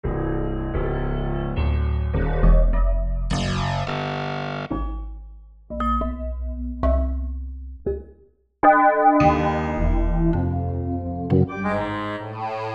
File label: Write Midi File